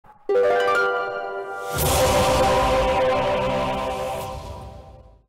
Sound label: jingle